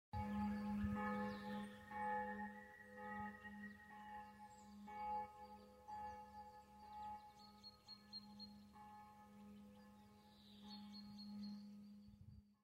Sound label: sunday bell landing